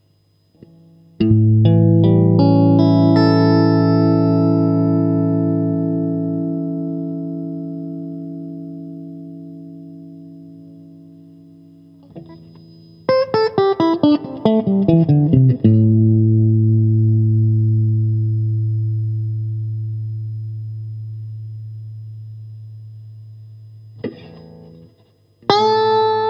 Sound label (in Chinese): TEXASS MWS 美产铜线 清音 4档; kindman